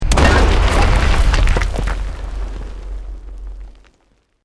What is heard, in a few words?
EXPLOSION MEDIUM STONE ROCK